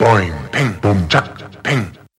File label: boing ping boom chak ping, boombap drum loops